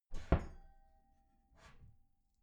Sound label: wooden door close soft glass clang